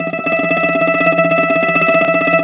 BZ TREME, BOUZ TREM

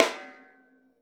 RAWSD8V1, Drums Acoustic; USB Soundscan vol.10; AKAI; 90s sample CD; ALL SD

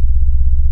MICRO C1, ANALOG BASS; Music Store - Claudius Diemeter Schwerlos

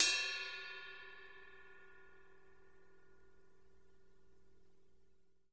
RIDE BELL

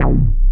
BASS C2 L, Jungle; JGL BASS; USB Soundscan vol.05; Explosive Jungle; AKAI; 90s sample CD

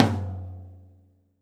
tom, Drums Acoustic; USB Soundscan vol.10; AKAI; DYNAKIT 2

